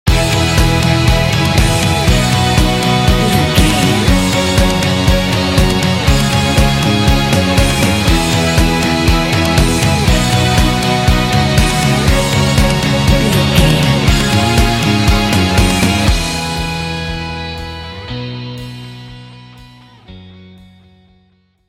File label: orchestral rock adventure and sports inspiration sting